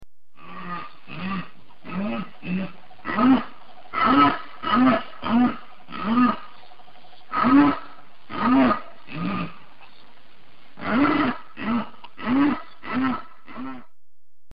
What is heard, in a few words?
Jaguar sound